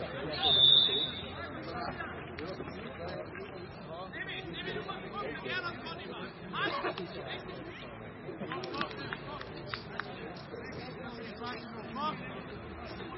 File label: Sport Mens Football